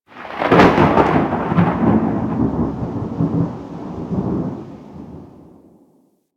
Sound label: Exterior Thunder Claps; Props; Warframe; Railjack